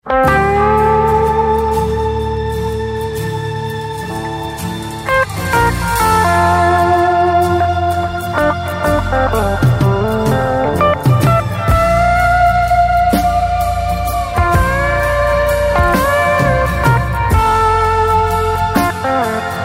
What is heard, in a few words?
Blue Guitar